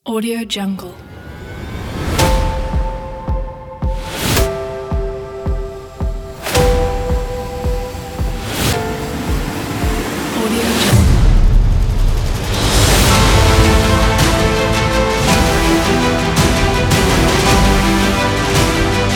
motivational uplifting epic story

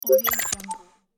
hightech device activate

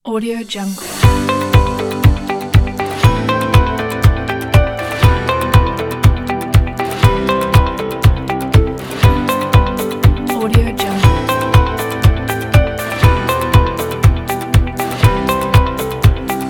upbeat corporate uplifting music

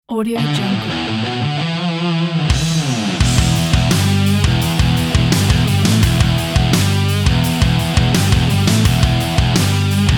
dirty rock

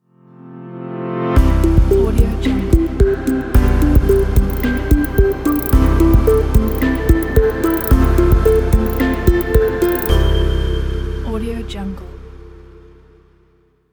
technology calm and inspiring logo

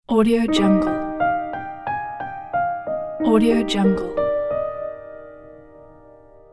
calm piano logo

